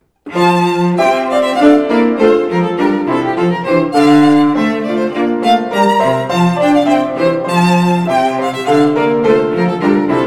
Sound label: Hornpipe in F trio